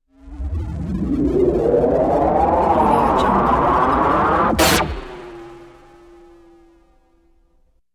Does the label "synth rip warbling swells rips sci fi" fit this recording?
Yes